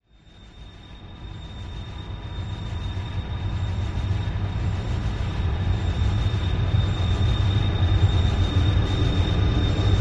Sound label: Message From Space, mechanical